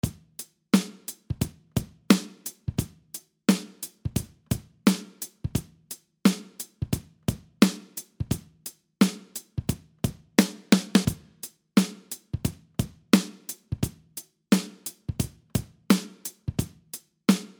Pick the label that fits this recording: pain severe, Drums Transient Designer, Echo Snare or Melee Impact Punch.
Drums Transient Designer